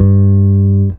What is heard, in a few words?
E bass (G); Sonic Foundry (Sony Creative Software); Ugly Remnants VOL-2; Basstuff